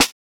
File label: Snare, JUNGLE BREAKS; ORIGINAL JUNGLE BREAKS; JBK DRUM SOUNDS; JBK SNARES